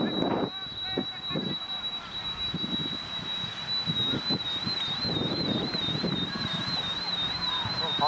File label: Doppler Ball